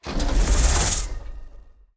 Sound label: wild snake; rise of maya